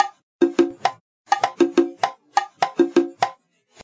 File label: Bongos Cumbia